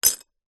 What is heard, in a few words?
household broken ceramic cup pieces down on surface; zapsplat